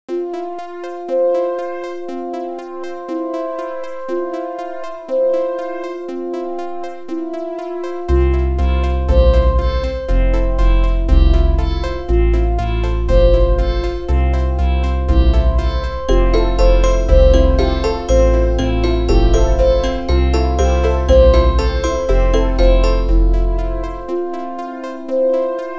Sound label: beat